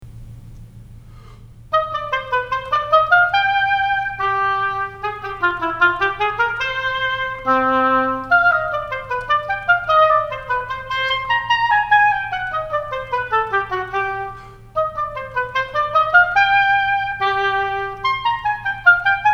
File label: Oboe High School